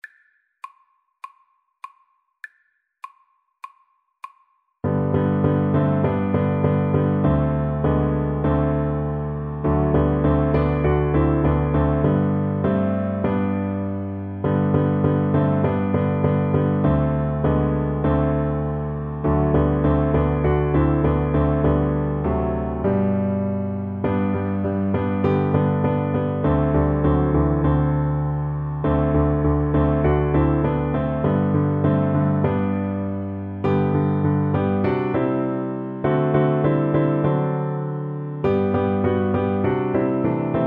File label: Trumpet